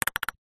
sport table tennis ball fast short bounces on table